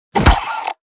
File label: Enemy Poult Damaged